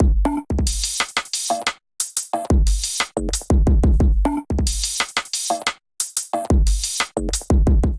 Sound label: Machine Speak Kit, glitch and wash drums; Ableton; Factory Packs